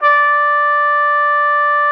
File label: D5, TRUMPET; Xsample VOL-3